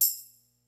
MEGA KIT, DRUMS; Kid Nepro; Elite

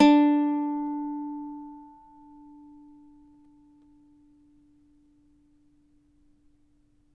CAVAQUINHO D, Guitars; Spectrasonics; Hans Zimmer Guitars VOL-2

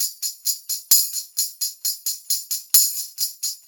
Brass Tamb, Perc Loops; Drum Tools

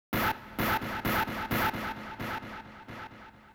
Funk Factory 131 F#min, Hardcore Breakbeat; Big Fish Audio; Hardcore Breakbeat Action

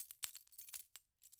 perc keys; Polyend; Recue; percs glitches and layers

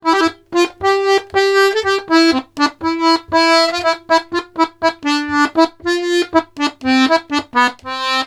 POLKA, Accordions; USB Soundscan vol.40; AKAI

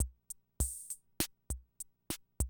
CR78, DrumLoops

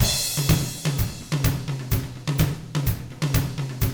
127 Drums

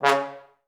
TB STAC C#2, Advanced Orchestra; Best Service; Peter Siedlaczek's Advanced Orchestra

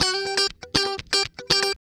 Guitar Licks

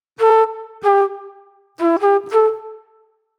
Mellow Flute

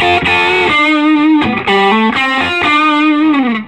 SOLO, Vintage Blues Guitar; USB Soundscan vol.22; AKAI

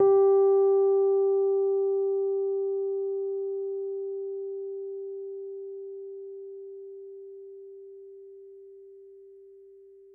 G4, Rhodes Mark II; CEPCo Sample Series Vol. 3

